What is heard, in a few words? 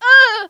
woman hit; Mount&Blade Warband